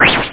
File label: lose shot